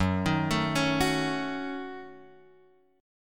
F sharp Minor 6th, Guitar Drop D

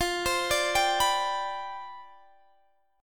C Major 7th Suspended 2nd Suspended 4th F

